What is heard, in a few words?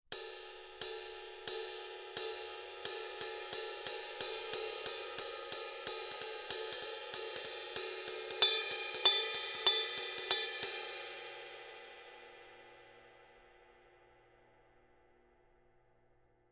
Color Sound 900 Black Ride, Paiste